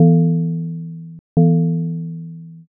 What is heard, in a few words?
belltoll